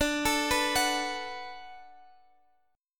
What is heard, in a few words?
D 6th D down, chord; D6 arpeggiated